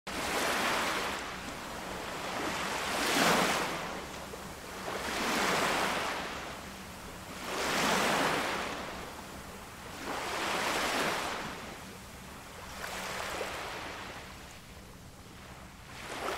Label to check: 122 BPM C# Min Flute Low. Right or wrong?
Wrong